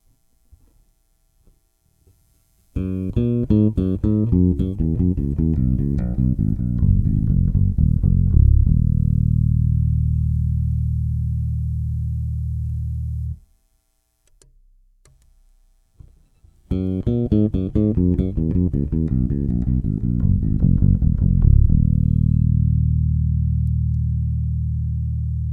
doubles hum; 5 string

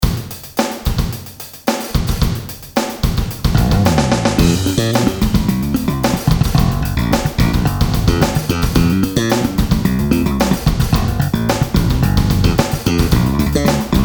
slap, bass; king koa; nordys

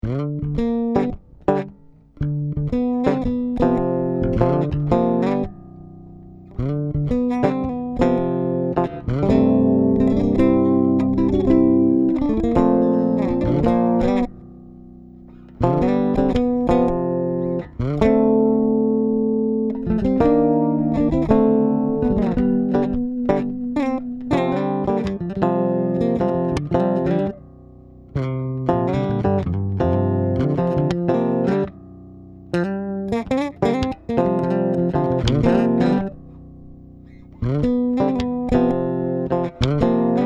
chords and leads

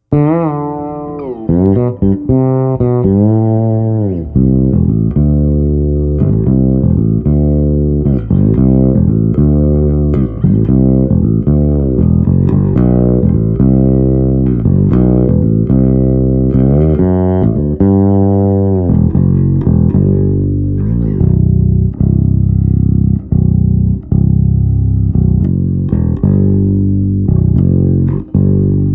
bass only

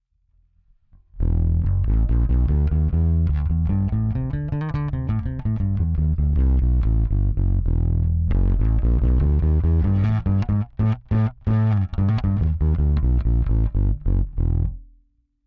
bmaxt active gain5, bass; bbe bmax preamp

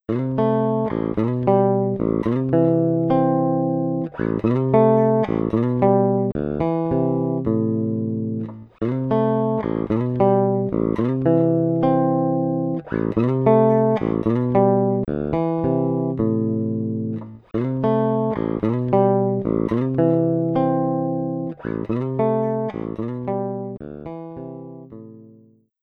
slap chords, bass; mike lull

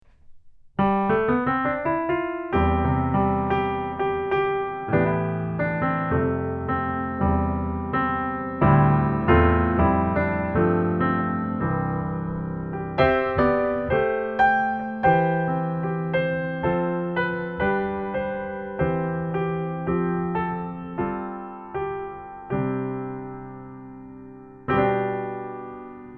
piano test; audient evo 16; OM1 pair

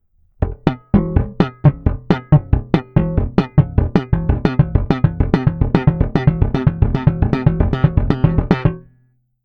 Triplets, slap exercise

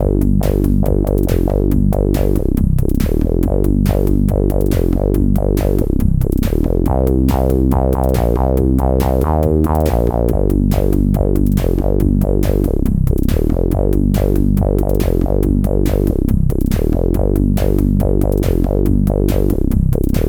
synt riff, bass; boss vf-1

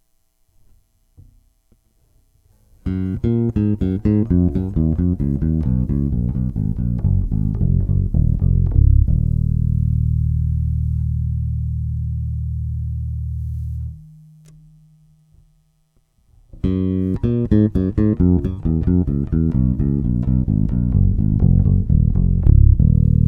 5 singles; 5 string

